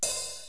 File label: hat open